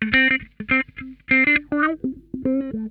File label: HIDE N SEEK, Funk Guitar; 90 s Sample CDs; Zero G